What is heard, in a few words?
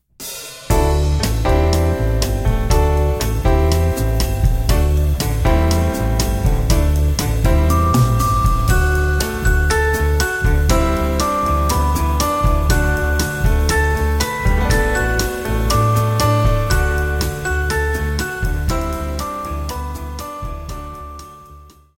Instrumental; Lord, I Want to Be a Christian